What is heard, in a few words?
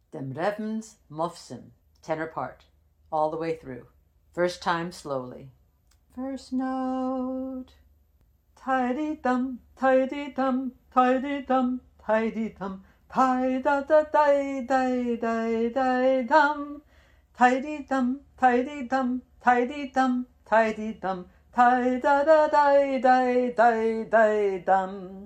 tenor; Dem Rebns Mofsim; mofsim chorus